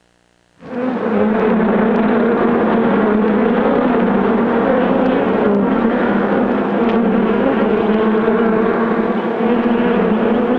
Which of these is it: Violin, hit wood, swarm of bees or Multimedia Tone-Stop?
swarm of bees